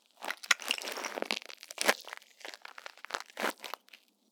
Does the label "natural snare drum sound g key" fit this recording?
No